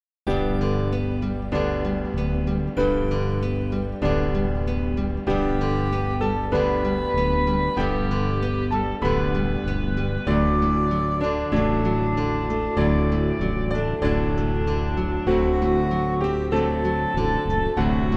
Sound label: instrumental; New Hope Music